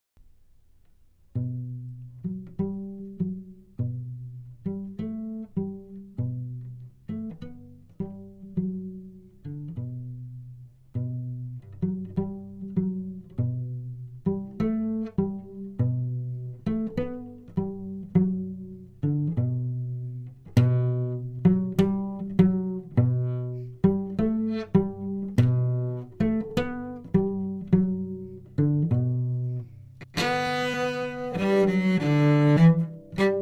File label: Tango Cello